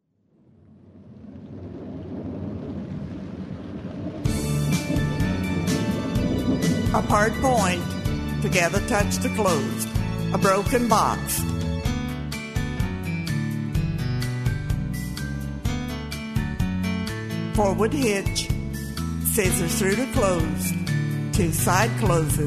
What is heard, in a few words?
vocal